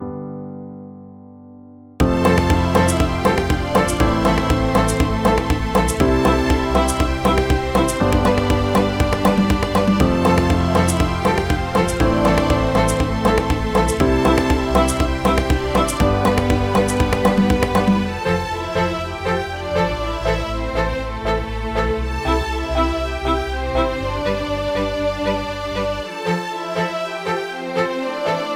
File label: Voyager Short Loop